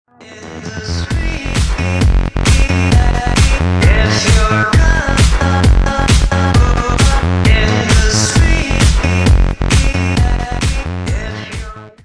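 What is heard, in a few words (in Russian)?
muzyka dlya donata, music for a donation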